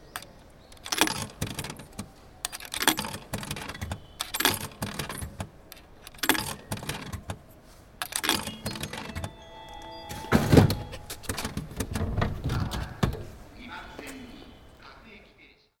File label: open; 硬貨5枚(不明)購入〜取り出し音; Panasonic製; N-JR2630VHP; 矢野口駅[JR東日本・南武線]